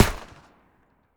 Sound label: MP40 Single Shot; Jagged Alliance 3